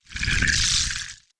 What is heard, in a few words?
dragon; khanat sounds sources; dragons; animals